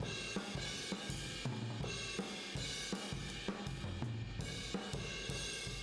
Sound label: Boogaloo Fill Room M, 82 BPM, Drums; Sonic Foundry (Sony Creative Software) - Drums from the Big Room The Mixes